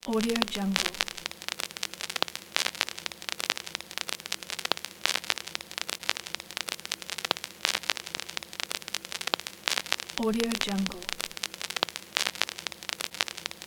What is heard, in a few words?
vinyl noise